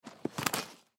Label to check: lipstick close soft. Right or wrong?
Wrong